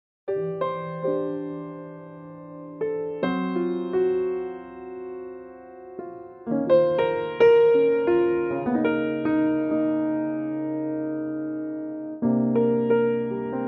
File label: Bb piano